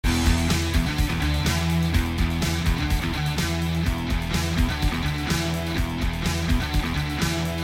background; Unikitty! Sparkle Blaster; NextlevelGames

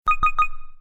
beep triple; Ben 10 - Tomb Of Doom

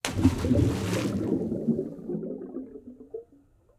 die water; Ben 10 - Omnicode

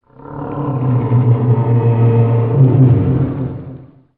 ghoul; Be Cool Scooby-Doo! - It's Dark Out There; ThrillGames

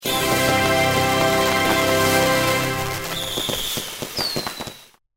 level Cleared; Oddbods - Samebods

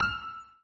blink; Be Cool Scooby-Doo! - It's Dark Out There; ThrillGames

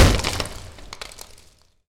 sfx crate explode; Ben 10 - Omnicode

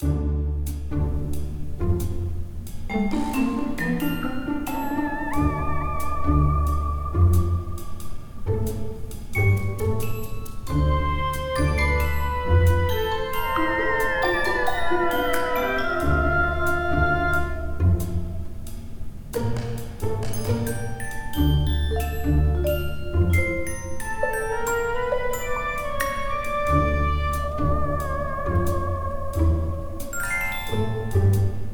gameloop; Bunnicula - Rescuing Harold

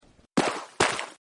sand; Legends of the Hidden Temple - Unlock the Past

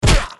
hurt; Ben 10 - Tomb Of Doom

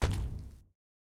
footstep heavy temple; Ben 10 - Omnicode

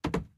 game trapdoor close; run-dorothy

